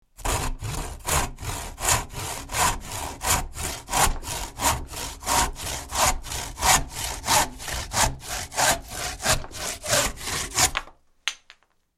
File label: Saw Cutting Wood Sound